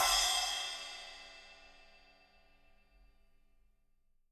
8 SPL 1, FX CYMBALS, Living Drums; Sampleheads; Peter Erskine Living Drums

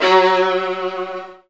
Virtuoso Strings, F#1; ILIO; Kirk Hunter Virtuoso Strings VOL-2

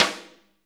snare jazz; Roland L-CD701; Snares 4; Sn Modules 4

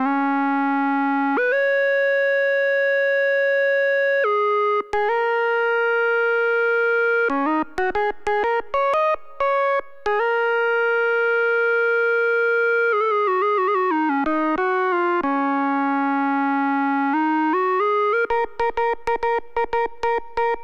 lead, Smooth RnB, 093 Ebmaj

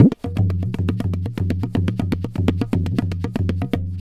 Tabla, 120 BPM; Zero-G; Pure Tabla

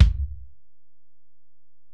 Ac BD, Alesis DM-PRO; 300 Drum Machines

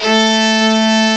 QUART HI, CMI Factory

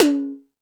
Dance 2000, Drum Machines E-MU Pro-Cussion

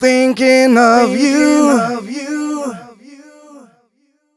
130 mel g, Vocals

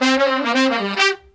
BRAZ LICK, BRASS LICKS

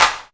CLP NOISE, Korg Electribe EMX-1

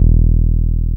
BAS TB303 E2

